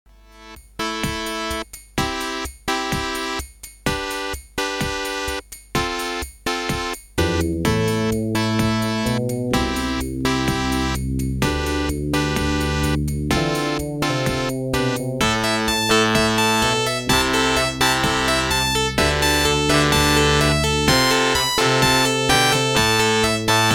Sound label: adlib; raptor lv1